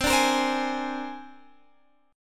C 7th Suspended 2nd Sharp 5th C down Guitar Standard; C7sus2#5 strummed; JGuitar